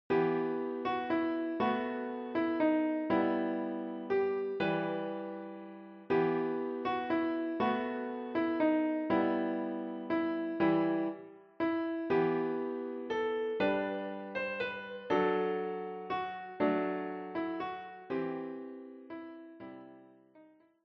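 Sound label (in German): Klavier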